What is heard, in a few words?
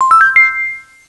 Bipple bell; Concentroids From Planet X